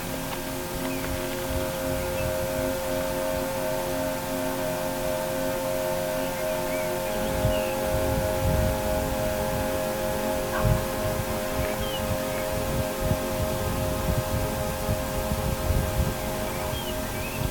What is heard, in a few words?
bird here